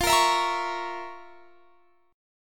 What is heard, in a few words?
F Major 7th Suspended 4th Sharp 5th, chord